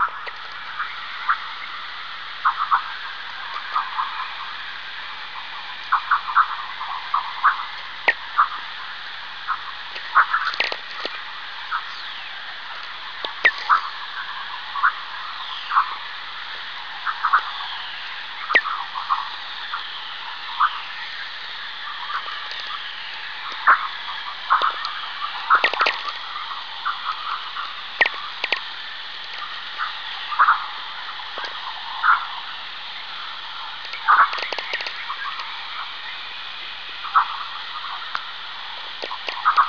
geomag storm

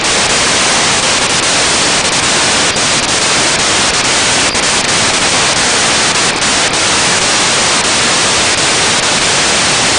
AIS ship 161.975 kHz NFM

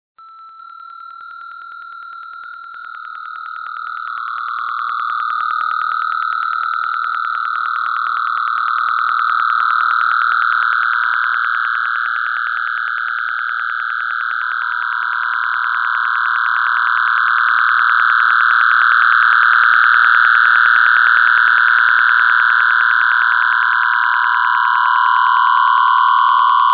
solar burst type3; messmer

